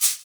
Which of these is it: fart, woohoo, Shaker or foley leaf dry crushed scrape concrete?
Shaker